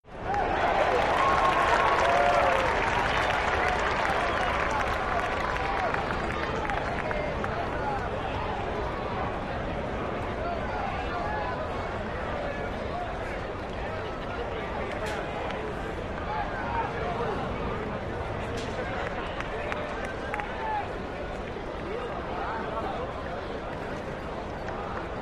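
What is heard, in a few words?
Arena Crowd Ohs Cheer; sports arena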